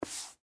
Office, Chalkboard, Erase, Chalk, Eraser, Single, Stroke, Classroom; Miscellaneous Office; BlastWave